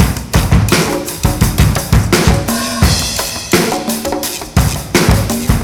bongo, 85bpm

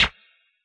SCR, Yamaha DJX, B900 Kit

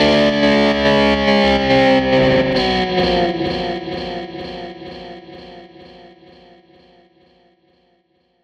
Strat Guitar Loop

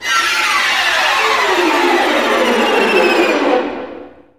STRING GLISS; Roland - LA Composer vol. 1

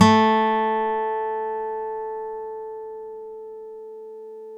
STEEL P A2, Synclavier, GUITARS; Synclavier Sampler Library

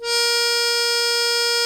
accordion A#3; InVision Interactive; Lightware VOL-1; Instruments & Percussions

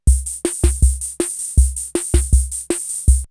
CR78 R77 BeatBox, drumloops, DrumMachines

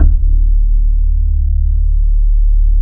Bass Analog & Synth; USB Soundscan vol.12; AKAI; HOPRIGHT

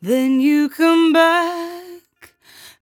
57bpm Am, Velvet Vocal; Zero-G

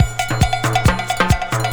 Percussion Loops, Brazilian; Houseworx

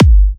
G, Kicks; markus hakala

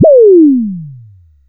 TOM KIT D#4, TOM SYNTH; AKAI; USB Soundscan vol.20 - Fresh Disco House I

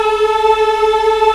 VOX AFMM, VOX Afro Mms, Africa

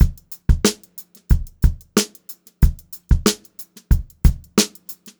BEAT, Funk-Groove Drumloops

